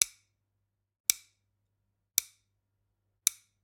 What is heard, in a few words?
Generic Click Wood Tiny; boom library; The Interface